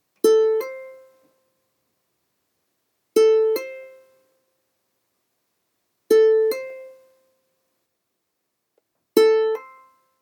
hammer on, ukulele

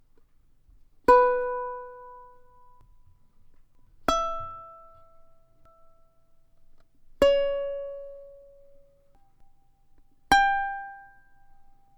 guess the note, ukulele

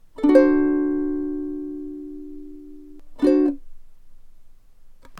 d7 stacatto, ukulele

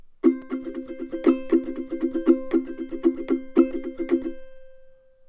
intro strum, ukulele

